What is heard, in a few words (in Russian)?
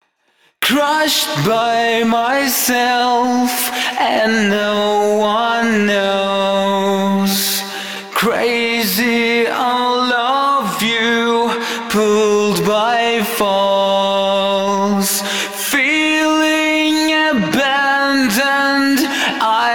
Steklo Crushed